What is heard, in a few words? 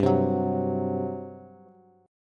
G Major 7th Suspended 4th, G, down, Bass; GM7sus4 strummed